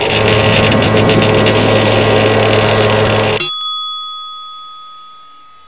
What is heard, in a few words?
upgrade; nzportable; machines; packapunch